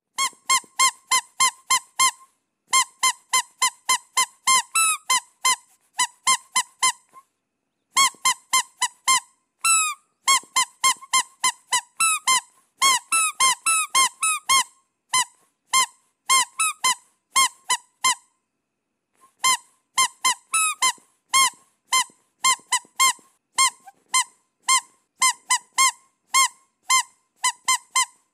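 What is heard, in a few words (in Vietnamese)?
tiếng bóp vịt cao su đồ chơi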